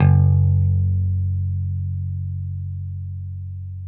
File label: 6STR BASS, G; Sampleheads; Whole Lotta Country 1 & 2